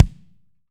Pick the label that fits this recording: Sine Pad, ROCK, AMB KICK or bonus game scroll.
AMB KICK